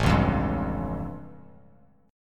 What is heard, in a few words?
A Augmented 7th A Bass Standard